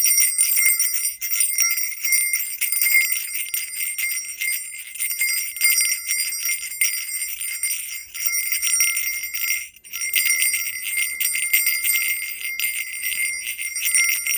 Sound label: small jingle bell